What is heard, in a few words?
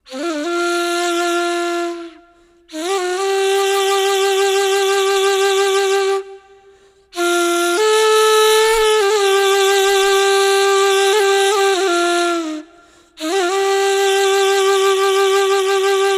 Ney, Wind Instruments; Sonic Foundry (Sony Creative Software); World Pop